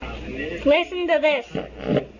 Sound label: fart